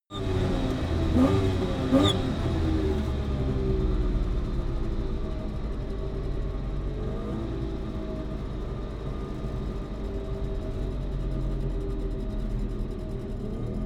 car saab 92 decelerate gear down interior

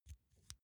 insert xlr extension slow; objekte; elektronik

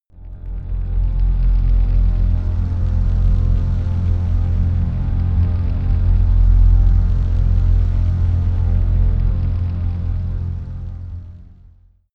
deep pad stagnant, Atmospheres; atmosphaeren

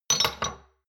shot glasses stack medium; berufe; gastronomie; soundpool

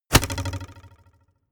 arrow hit; krieg-waffen; pfeil-bogen; soundpool

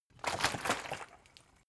bottle water big upend; berufe

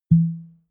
bottle whisky cork pull out, gastronomie; berufe

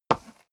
step leather shoes wood parquet slide short; schritte

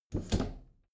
bathroom cabinet hinge scratch; objekte; tueren

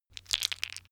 stone gravel clatter; natur-tiere; steine

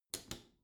bathroom cabinet close soft slow; objekte; tueren

